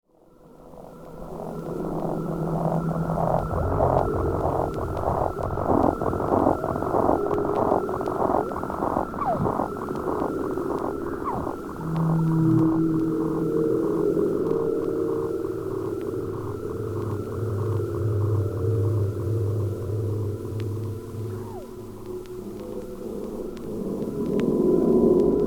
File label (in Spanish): musica electroacustica